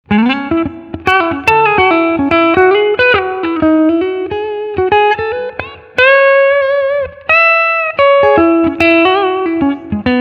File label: lead line clean